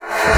AIR, BASSOON; Xsample VOL-6

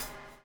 BUBL, Backbeat; Spectrasonics - Backbeat

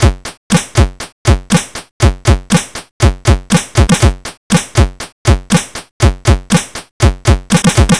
C64 Beat, chiptune drums; Commodore 64

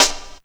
SD, Drum & Bass, 150 BPM

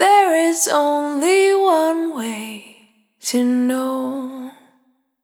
Jericho, Single Voice Melodic Loops; Sony Creative Software; Pocket Diva World Class Vocal Samples

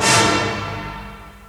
ORCHESTRA HIT; Crypton - DrumBase5000

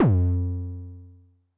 BD MOD Overdrive High, bass drum, 909; classic machines; 909mod

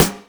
Snare; Equipped Music; Loungin House

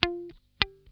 NOTE SCALE, Funk Guitar; 90 s Sample CDs; Zero G

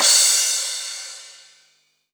Crash, Drums Cymbal

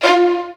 D#3, stringed; East West; Global Sounds

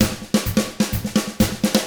127 Drums

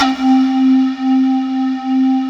MALLET C4; MALLETTE

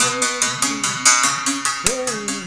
BERIM GRV, BERIMBAU; Spectrasonics; Heart of Africa 2

